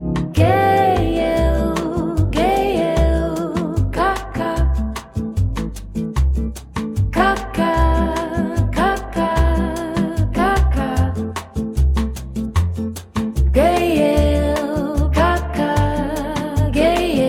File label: bossa nova